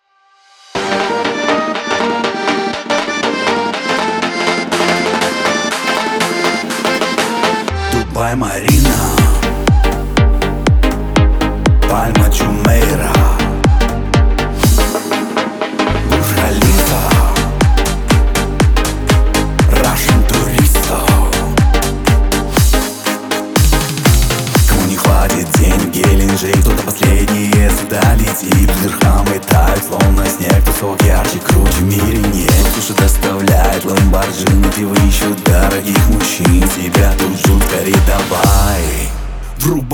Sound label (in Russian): lezginka